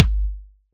Kick Volt

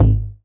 Tom; Ableton Live 12 Lite; Core Library; Drums

